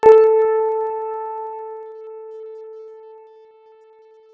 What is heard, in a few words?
piano, synthesized